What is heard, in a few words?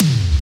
80s tom